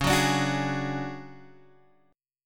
D Major Sharp 11th C sharp, chord

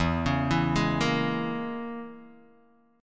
E 6th Flat 5th E down Guitar DADGAD

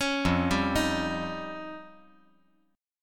F 7th Suspended 2nd Sharp 5th

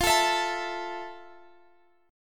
F Major 7th F, Guitar, Standard; strummed; capo 9

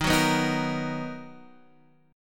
B Major 7th Eb

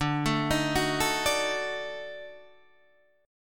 Eb Minor Major 7th Flat 5th

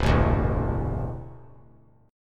C 6th Add 9th C down; strummed; JGuitar

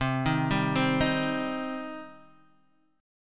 C Minor C down Guitar Open D

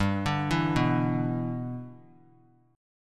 G 6th; G6 arpeggiated

